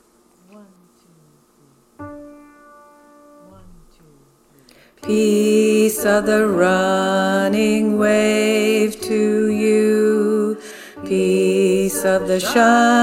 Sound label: Tenor men; choir; The Freedom Choir